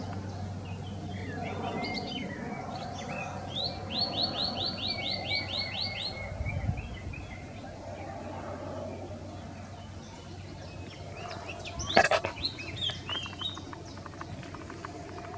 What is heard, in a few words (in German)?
moenchsgrasmuecke